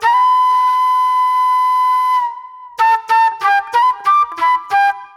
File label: Sharp Delay Flute